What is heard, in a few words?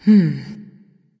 NV Thinking; smite; awilix